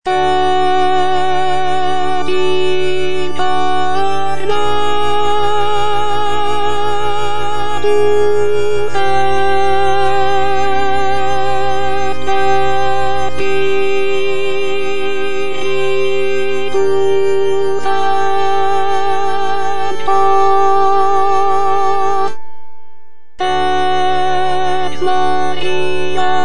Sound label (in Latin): Alto Et Incarnatus